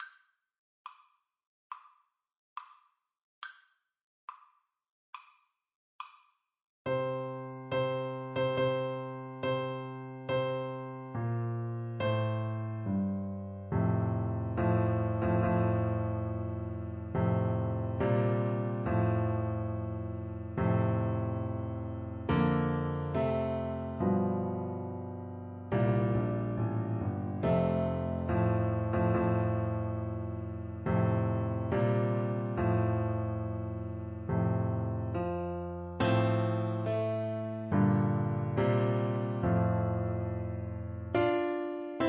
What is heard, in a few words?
tenor saxophone